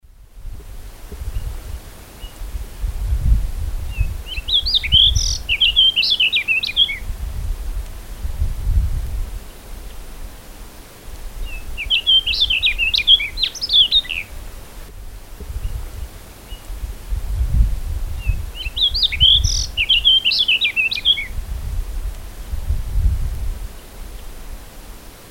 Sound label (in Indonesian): suara panggilan burung decu jantan